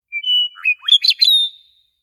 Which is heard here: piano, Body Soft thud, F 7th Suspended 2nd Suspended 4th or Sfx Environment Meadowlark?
Sfx Environment Meadowlark